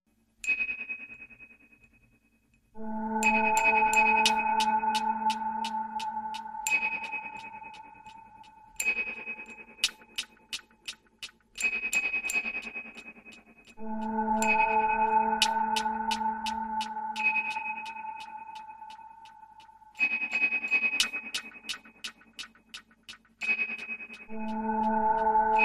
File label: Ambient Hush, Reduced